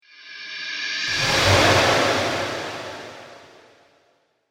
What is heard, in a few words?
Trailer Hit Diver Down